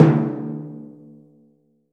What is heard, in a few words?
timp C2, timpani; AKAI S6000 CD-ROM - Volume 5